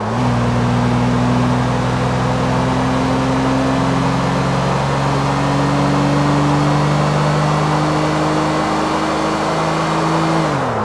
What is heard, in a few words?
fourth, vehicles; primo